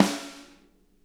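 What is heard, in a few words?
snare edge; Sonic Reality; Interactive Drum Kits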